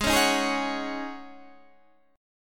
Db Suspended 2nd Suspended 4th Ab, chord

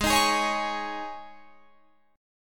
Gsharp Suspended 4th, Guitar, Drop D; 18,18,18,18,21,21

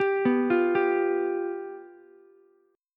F Suspended 2nd Flat 5th Ukulele, chord; arpeggiated; 12,11,13,10; JGuitar

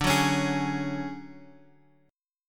D Major Sharp 11th D Guitar Open F, chord; strummed; JGuitar

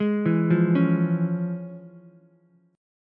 F Minor Major 7th Sharp 5th Ukulele; FmM7#5 arpeggiated; JGuitar

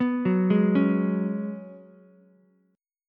C sharp 7th Suspended 4th C sharp, Ukulele; C#7sus4; arpeggiated; JGuitar

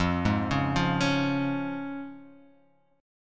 F Minor Sharp 5th F down

